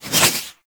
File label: Warfan Whoosh; Warframe; Weapons; Melee